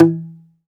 Domra E2 Mute; EMU Planet-Earth

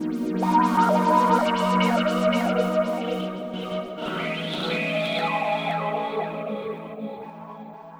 Pad; Sonic Foundry (Sony Creative Software); Synchro Funk

